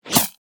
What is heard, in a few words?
warfare arrow pass by